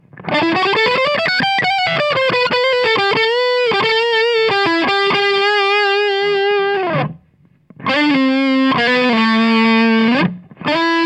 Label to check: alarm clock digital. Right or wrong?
Wrong